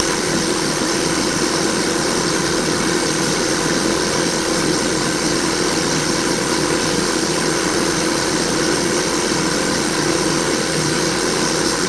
blender

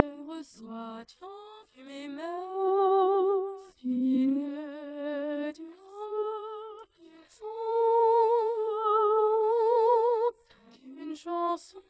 singing

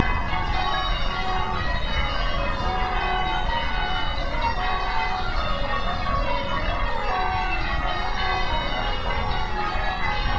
bells

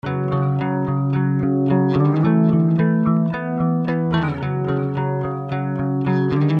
mp3 good

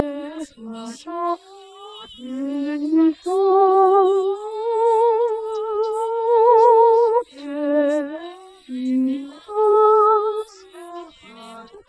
singing

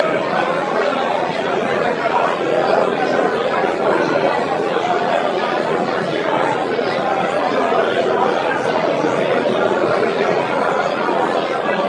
crowd

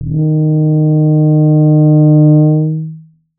cresc

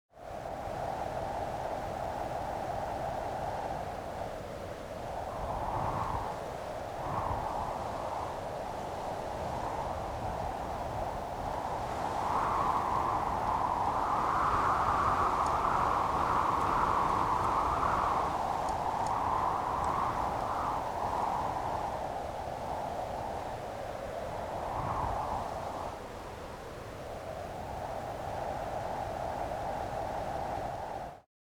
wind resynthesis example linear up-down

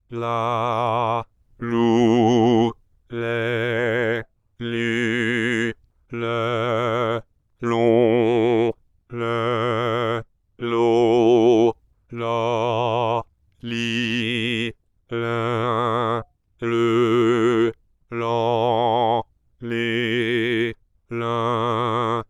vowels no scale

